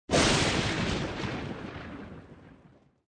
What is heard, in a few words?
Ball Arrival